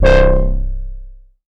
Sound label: Moog Model D BASS Future High - C; Synth; One Shots; Cymatics; Fantasy Synth Sample Pack